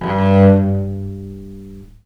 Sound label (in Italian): G1, Historical Instruments, VIOLE MUT; USB Soundscan vol.23; AKAI